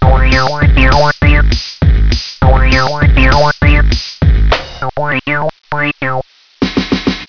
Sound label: jungle tune; firearms